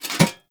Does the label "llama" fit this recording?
No